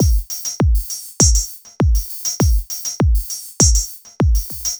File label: Hats Kick Beat, Electronic Beats; Sonic Foundry (Sony Creative Software); Myths of Technology